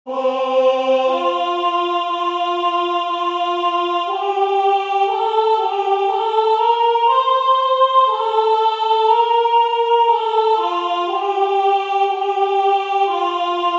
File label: o little town of bethlehem all soprano